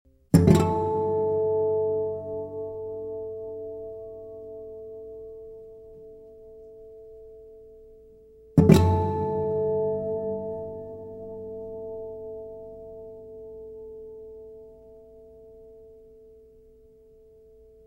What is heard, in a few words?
bells, instrumental